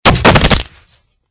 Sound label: Player Land; Granny 3; Android